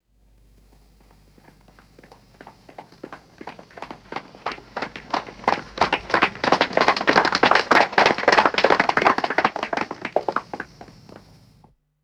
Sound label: multiple footsteps running and stopping on pavement; foley